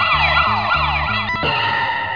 AMBULA; Dioses Mayas; CIUDAD

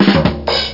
PERCUSIO; monopoly

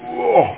GOLPE; Creative Voice

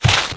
golpe hierba; blade

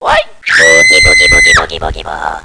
SUSTO; GEOGRAFIA

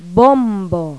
bombo; Pipo-Aprende musica con Pipo

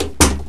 CANDADO; El Juego De La Oca Loca

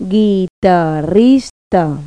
GUITARRI

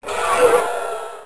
Monje Muere; Grounch

